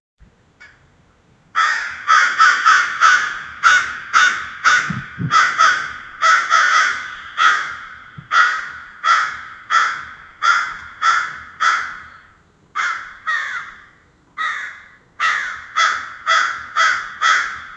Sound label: crow screaming loud, bird